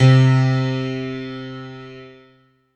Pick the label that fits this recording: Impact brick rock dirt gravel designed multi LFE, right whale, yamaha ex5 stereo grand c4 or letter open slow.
yamaha ex5 stereo grand c4